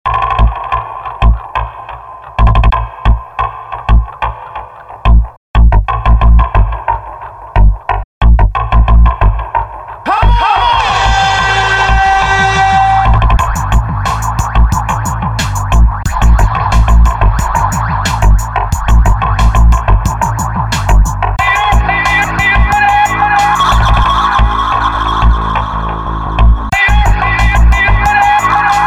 reggae vibe

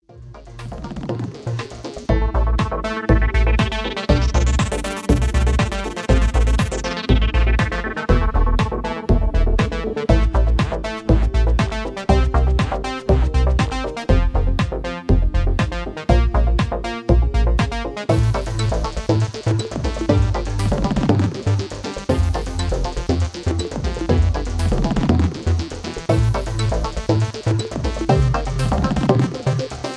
Electro

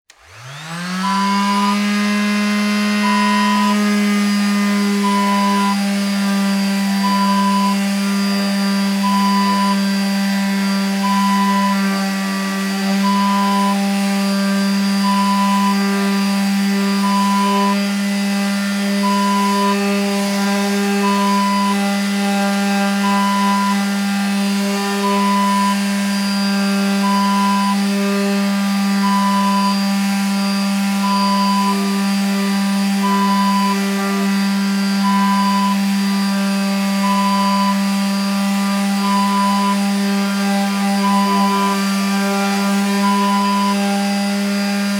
sander

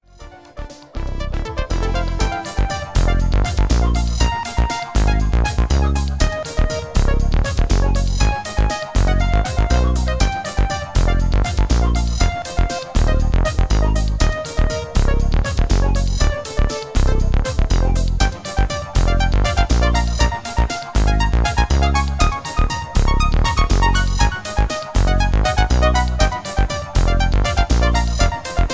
Electro

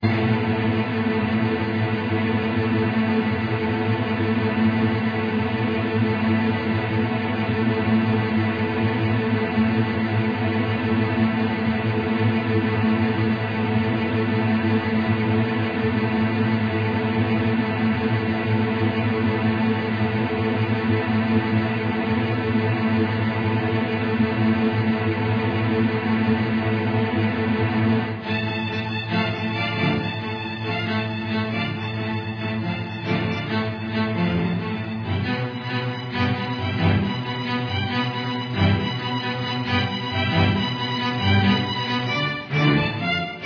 D Minor Dark Strings

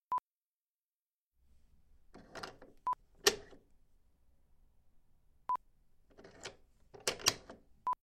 Door Twist Lock

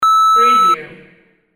beep

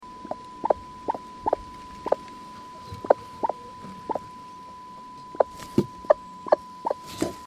Chicken Cluck Quiet

